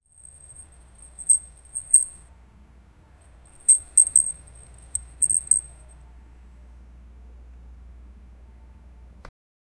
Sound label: string creaks pulled by